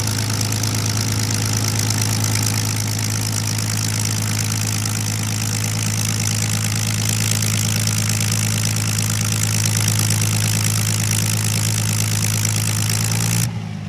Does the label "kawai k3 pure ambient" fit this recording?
No